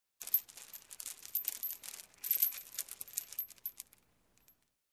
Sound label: Glass splinters sparkle